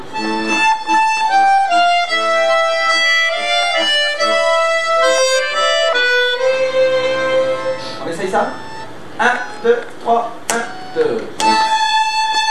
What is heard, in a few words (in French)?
Voix 2 violon partie 1